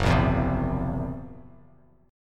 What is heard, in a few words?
Fsharp 7th Flat 5th down Bass Standard; strummed; JGuitar